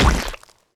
explosion slime; Epic Toon FX

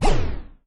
shoot energy; Epic Toon FX

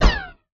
shoot laser; Epic Toon FX

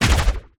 explosion storm; Epic Toon FX

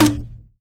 shoot grenade; Epic Toon FX

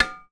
impact metal

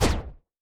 explosion plasma

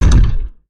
shoot energy; Epic Toon FX

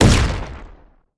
explosion rocket; Epic Toon FX

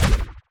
shoot storm; Epic Toon FX

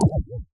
stun; Epic Toon FX

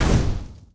explosion fireball; Epic Toon FX

